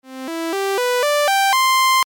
legato

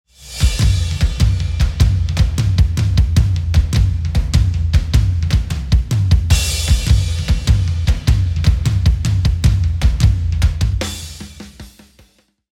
drumbus bypass

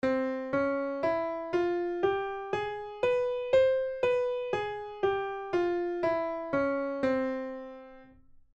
c arabic

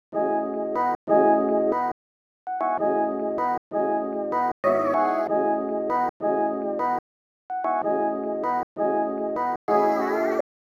Push Chop

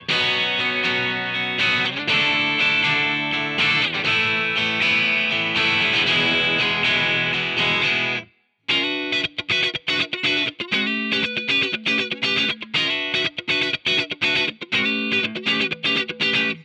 Amp

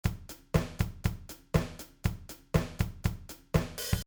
drum loop

